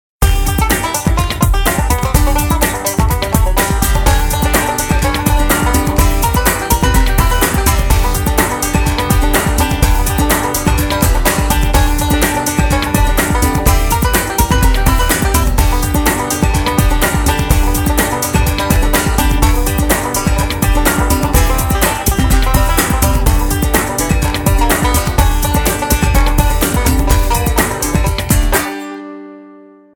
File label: music; alienarena; cp ribeye